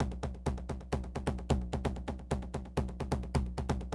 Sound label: 130, Classic DrumLoops